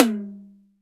TAMA TOM, DRY KIT; AKAI S6000 CD-ROM - Volume 1; DRUM RYTHM

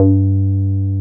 303 SQR G3 P, SQUARE BS

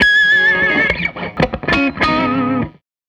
Tele Guitar Loop

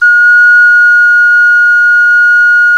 Clay Flute

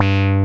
ACI WAAH BS, TECHNO; Pro-Rec - Synthsonic; Z1 TECHNO

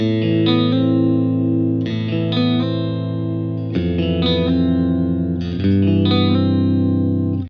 Electric Pop Guitar 128BPM Amin; Electric Pop Guitars; Vengeance - Essential Clubsounds Vol.4; Guitarloops

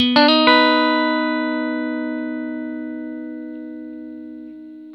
blues guitar riff 120, Vintage Blues Guitar

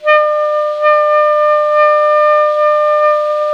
ALTO PP D 4, saxophones; Greytsounds - Philip Wolfe David Crozier's Saxophones; ALTO SWITCH

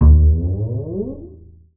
SLIDE D1, BASS FINGER; Xsample VOL-5

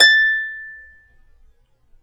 A5 HARP; USB Soundscan vol.62; AKAI

